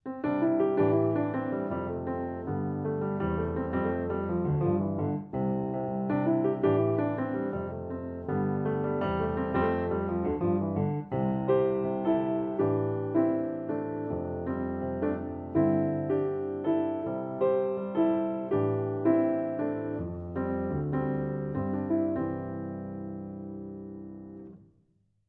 Solo Piano; 30sec

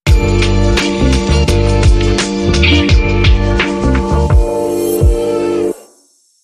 Short Sting

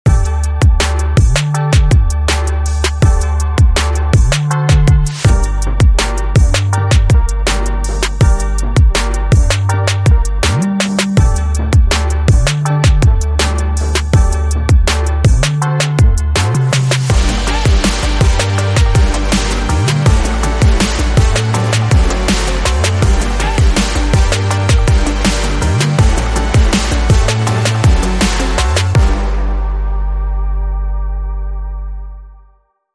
Brass Kicker

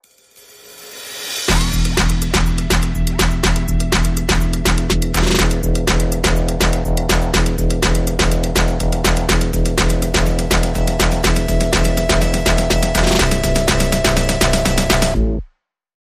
Neon Nitro, Percussion Bass and Effects

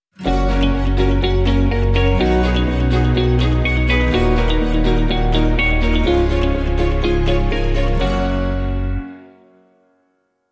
Positive Change Sting No Drums

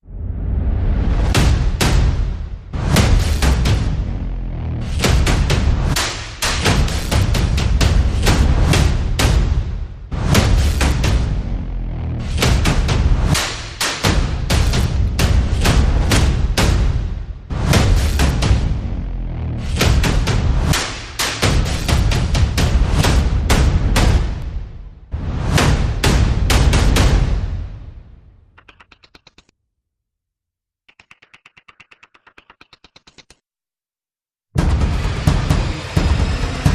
percussion and FX

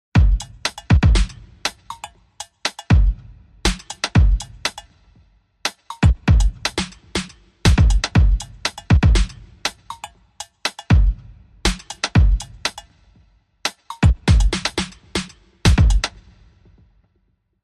Beats Only

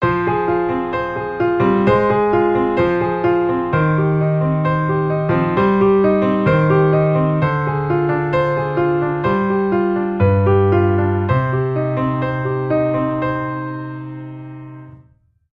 Piano Intimate

